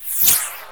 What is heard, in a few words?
GLISSADE